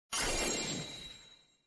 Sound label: legendary drop; diablo